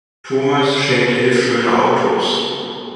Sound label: Reverb Hallway